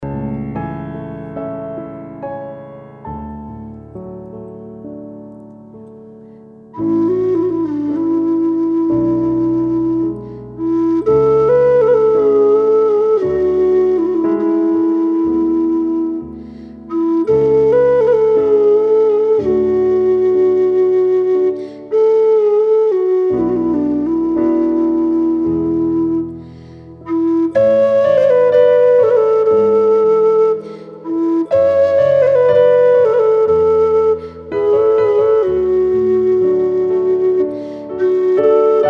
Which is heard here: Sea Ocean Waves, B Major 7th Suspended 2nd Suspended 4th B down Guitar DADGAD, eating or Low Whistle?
Low Whistle